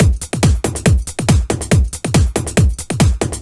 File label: Kick Loop, 140bpm; Zero-G Trance Inducer; Drum Loops 140bpm; 90 s Sample CDs